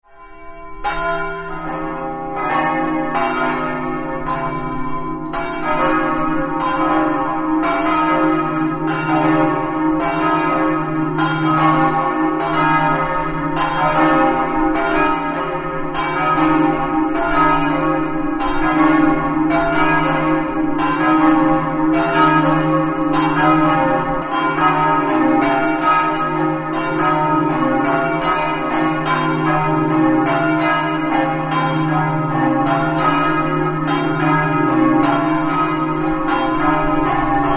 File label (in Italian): mels, campane